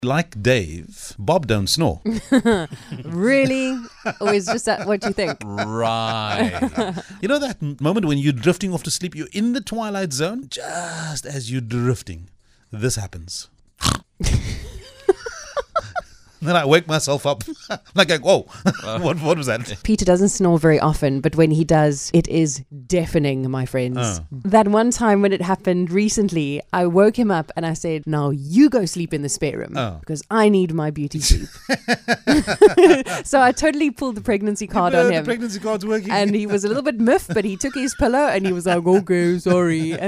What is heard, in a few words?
team snoring